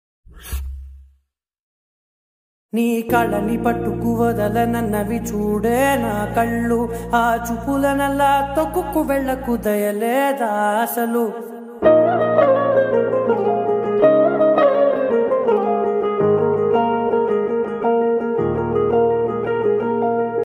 promo ringtone south movie ringtone; alavaikunthapurramuloo

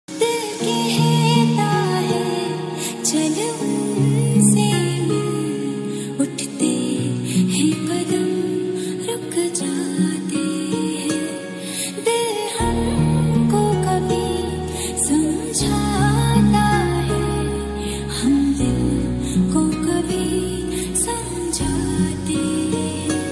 ringtone song, new love ringtone song

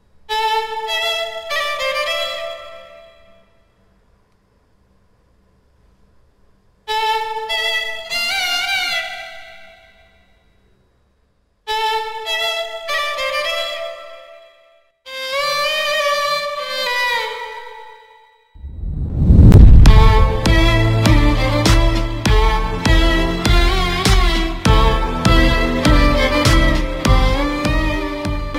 song bgm, violin bgm; puriyatha puthir movie; vijay sethupathi

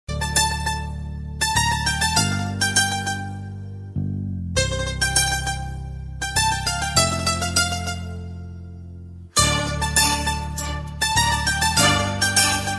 love beautiful melody bgm ringtone; venkatesh

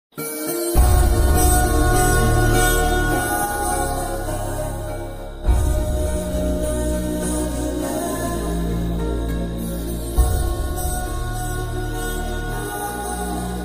telugu new bgm music love; yamudu; surya; anushkashetty

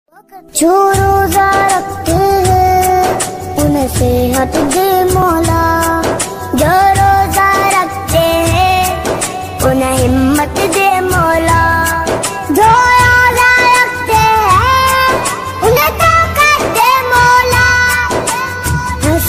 islamic ringtone ramadan ringtones

